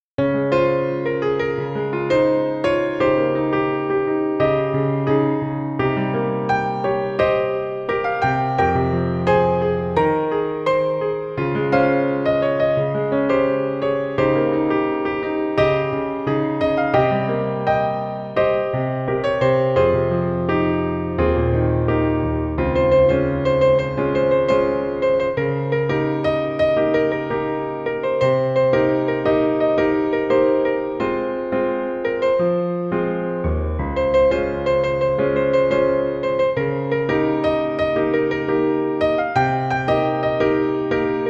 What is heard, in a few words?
Piano Arrangement Version; Fairy Fencer F Refrain Chord; Notes of Faith